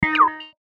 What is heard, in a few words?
button rhythmic sweeper; Multimedia; Buttons; Blastwave